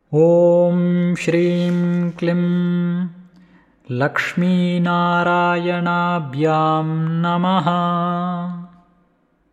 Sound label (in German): Lakshmi Mantra fuer Reichtum und Wohlstand